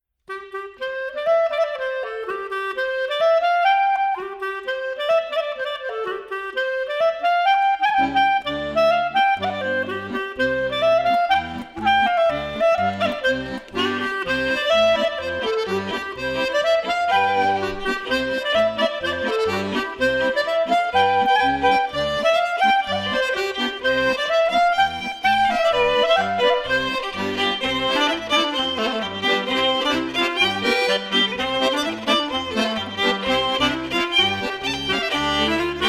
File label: Polka